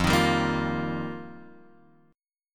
F 7th Suspended 2nd Suspended 4th, Guitar Open F, F7sus2sus4 chord; strummed; JGuitar